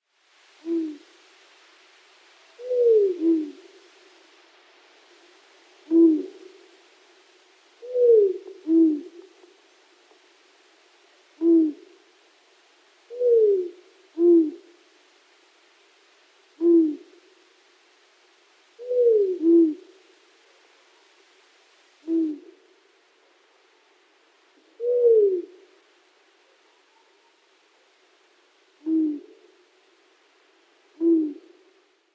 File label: Long-eared Owl pair display